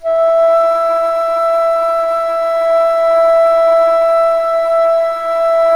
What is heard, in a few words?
ALTO E4, ALTO FLUTE; USB Soundscan vol.23